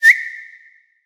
decoy whistle hyo

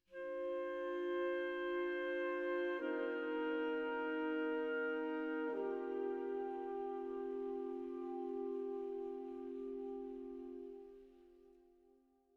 Env Sky High Altitude Melody

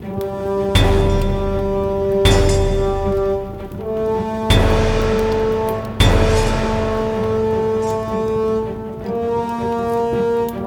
Get Sword; Cross of the Dutchman

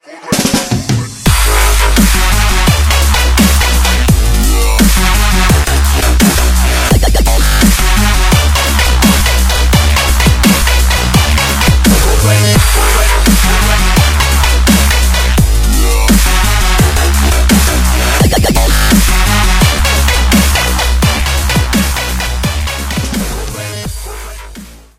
End Level; dashy square

